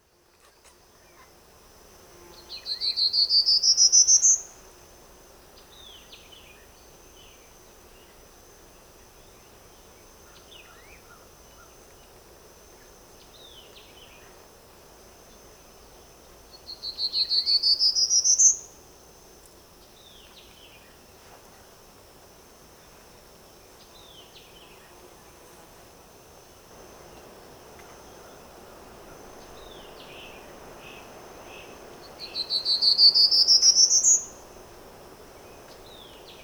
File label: 6 Songs